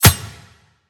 impact blade; Smite; Cabrakan

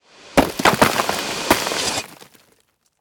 death buildup; smite; kuzenbo

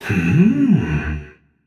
thinking, voicepack; smite; jorm

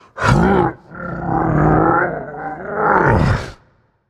grunt lift; Smite; cerberus

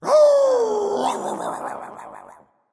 wolf; fenrir

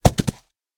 chariot horse hoof; smite; Apollo

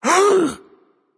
breath, voicepack; smite; jorm

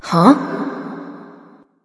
confused light; smite; voicepack; hel

